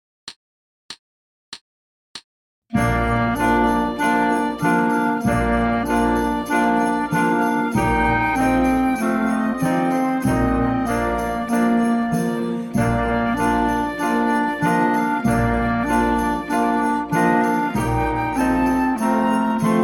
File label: russian folk song, 96 bpm